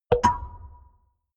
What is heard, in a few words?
sci-fi bleep; maschinen; signale; soundpool